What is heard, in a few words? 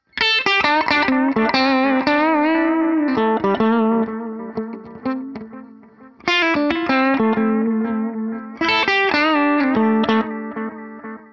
Delay Short; GFS Effects